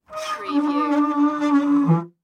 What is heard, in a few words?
Metal Scrape Squeak